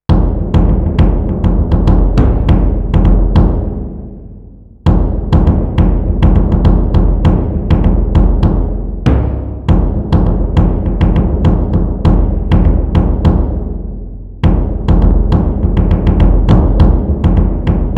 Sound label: Barabany ritualnye drams ritual